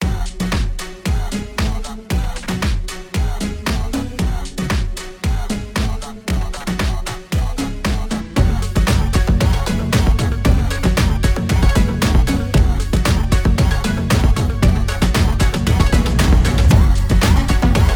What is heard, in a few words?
midnight mosh phonk